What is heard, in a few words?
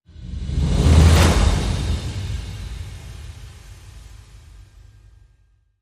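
Whoosh; Finish With Flair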